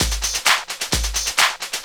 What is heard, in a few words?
130 addon, DRUMLOOPS, HIHAT; Ueberschall; Techno Trance Essentials; LOOP ADDON3